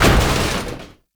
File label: Metal Crate Destroy; Gameplay; Warframe; Tau; Destructible Dynamic